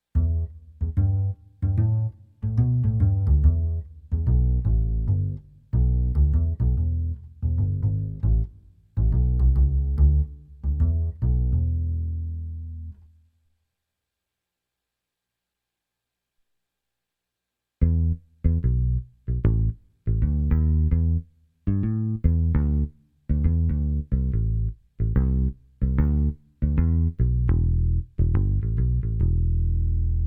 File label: Kawai MP11 Bass